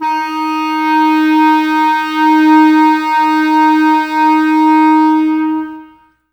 Clarinets, Wood Winds

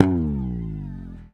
FRTLSS, Bass; Sampleheads; Will Lee Bass Library; F JZZ

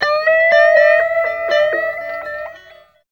GTR; East West; Phat & Phunky

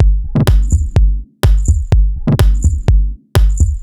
A 125bpm, House Drumloops; 90 s Sample CDs; Zero-G House Fabrik Drumloops; ZG Drumloops

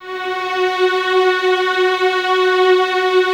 Violins F#4, String Ensembles; Full; 23 Violins; Miroslav Vitous

